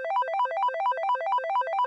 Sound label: spinning